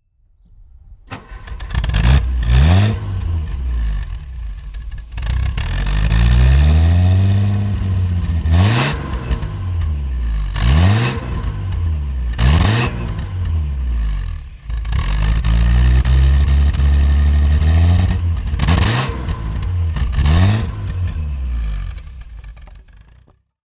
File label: Hotchkiss Anjou 20 50; Worblaufen 1950; viertueriges Cabriolet